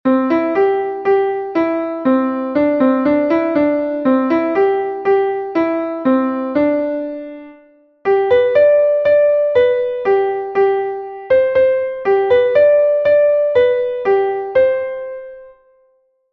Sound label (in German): klavier; Frühlingslied; MelodieMeister